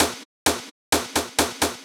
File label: PERC LOOP A 130